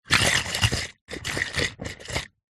food drink wine red quater bottle shake; zapsplat

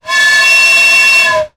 sound design effect air pipe pressure

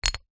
clay hard pieces bash against each other

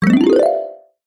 cartoon ascend climb fast mallet; zapsplat

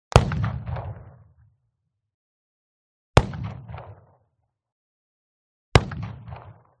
WEAPONS REVOLVER Shot 44Cal Remington 100m; SmartSound

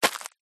footstep single ground farm hay dirt

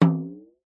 cartoon drum hit bounce

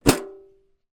foley small metal case tin unlock ping; zapsplat

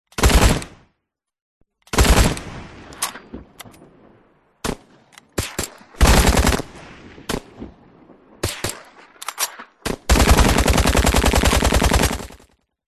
guns shooting machine gun bursts designed; audio mangler

